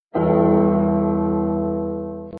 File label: big ben, ringtones